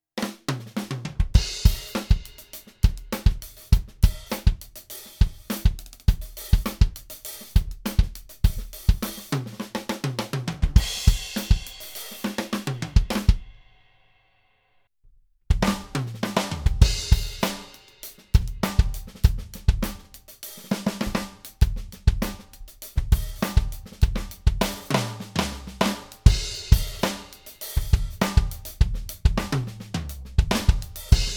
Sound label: Roland TM-6